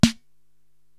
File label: SD Dark & Thin, Korg TR-Rack drum machine